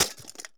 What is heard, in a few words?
Bullet Into Glass; Sony Pictures Sound Effects Series; Ricochets - Hits - Whiz Bys